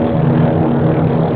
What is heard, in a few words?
Plane Prop Loop; Airplanes